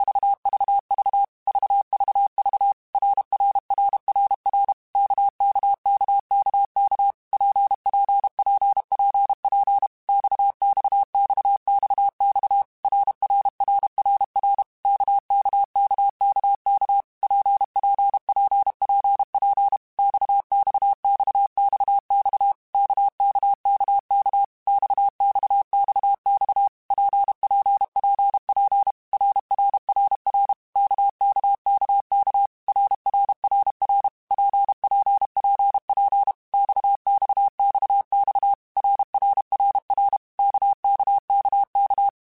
RKPX, Morse Code; CW Code Groups; Basic Letter Groups By7Groups; HamRadio; Basic Sets

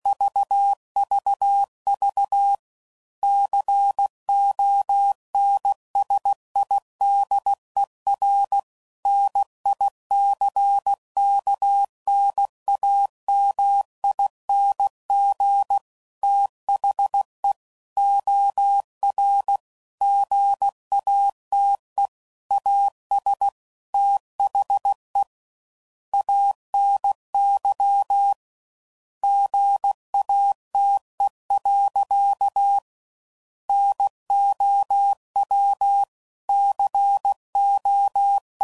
CW Code Groups 15WPM; OR Gate; HamRadio; Morse Code; Technical Subjects

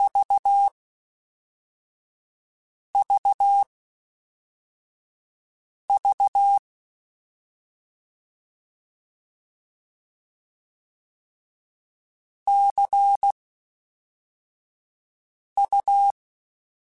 Word Pairs, Morse Code; HamRadio

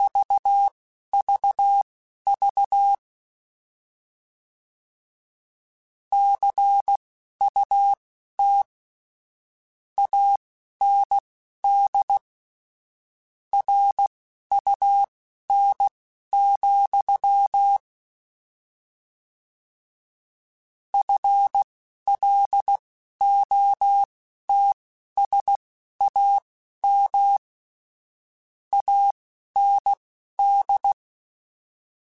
Word Pairs, Morse Code; HamRadio

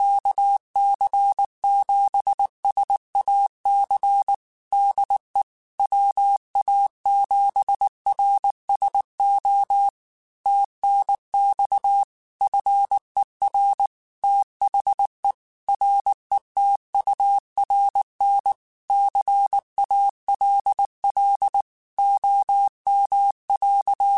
Morse Code, QSOs

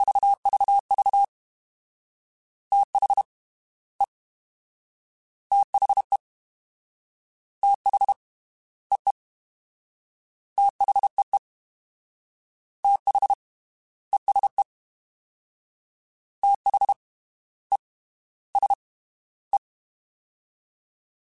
Fast TH, CW Code Groups; Mixed; Prefixes

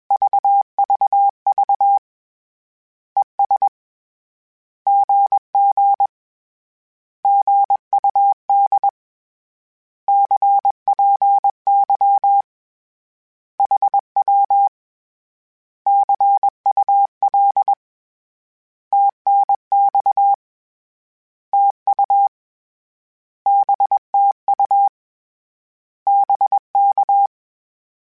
Words, Morse Code; HamRadio

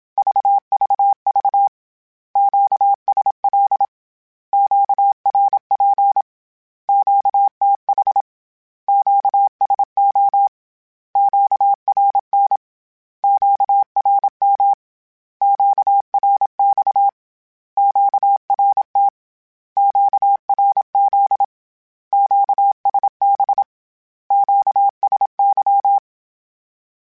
Q Calls Once @25

